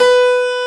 Big Band Fast; Roland L-CDC-01

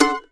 MANDO 7TH D7; Sampleheads; Whole Lotta Country 1 & 2

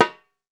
repique; World Combo

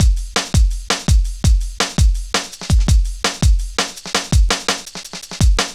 Drum Loops, Jungle, 166.5; Zero-G Jungle Warfare 1, 2 & 3; Breeze; 90 s Sample CDs

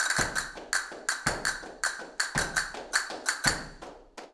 Castanets, Flamenco; Best Service ProSamples vol.46; Percussion

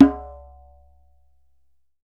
DJEMBE, African Perc

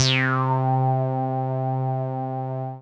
Dance Floor Bass, Multisample Basses

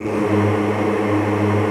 choir, G1; Best Service; Extended Classical Choir

